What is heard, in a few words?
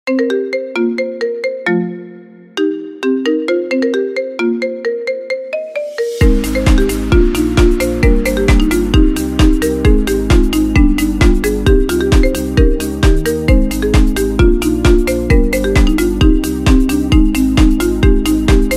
marimba, نغمة رنين ماريمبا; fast marimba